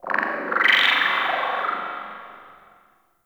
Ship Data Chirps; Warframe; Corpus Ship Remaster

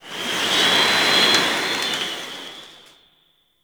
Destruction Space Wind; Ambience; Warframe; Corpus Railjack

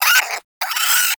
Cryptilex Vocal Alerted; Characters; Warframe; Hunting Critters

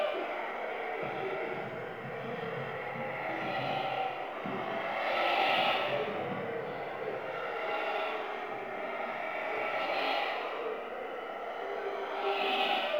Infested Eris Interior Critter Loop

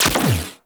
Turret 3D Fire; Weapons; Warframe; RailJack; Corpus Crew Ship

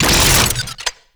Beam End; Warframe; Larkspur Prime

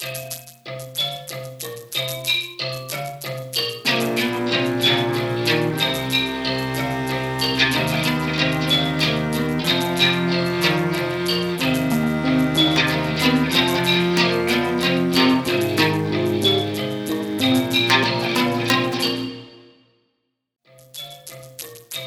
Intro Cinematic Cue; Entrati; TennoCon 2023; Cinematics

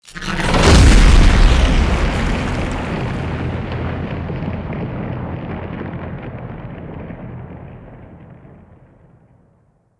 Amp Up Cast; Characters; Enemy; Nimrod; Abilities

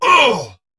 Pain; Warframe; Dax Ashigaru; Battle Barks

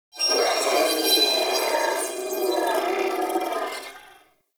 Sentient Symbilyst Idle, Barks, Enemies; Warframe

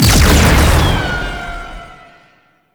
Shield Hit; Makvoss Cannon

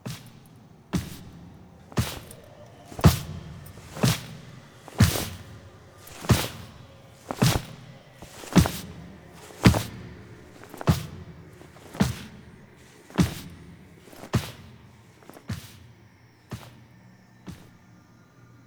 Carry Foley; Cinematics; Epilogue; Drifter

